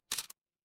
office camera

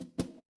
feedback level up

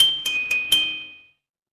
ui chime

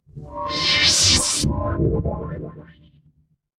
magic scifi teleport

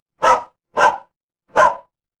animal dog bark

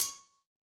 impact metal hit

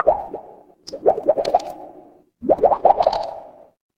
water bubble

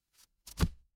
paper fabric page turn

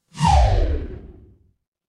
transition sweep

